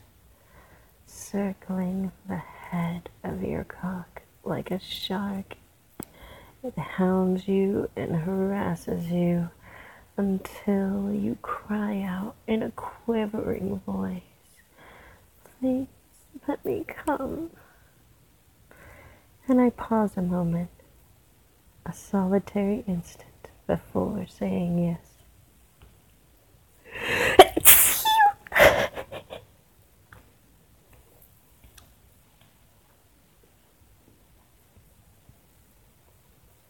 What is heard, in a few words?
sneeze